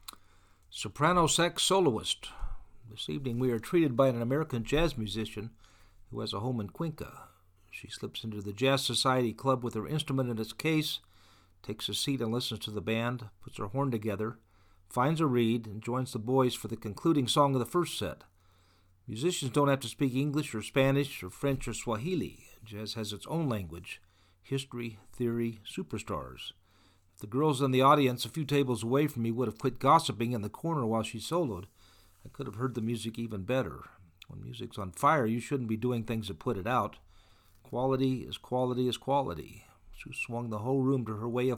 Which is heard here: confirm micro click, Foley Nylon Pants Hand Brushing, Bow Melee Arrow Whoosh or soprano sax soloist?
soprano sax soloist